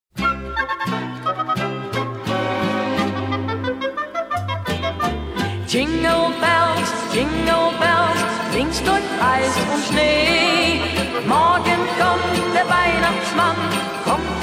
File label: jinglebells german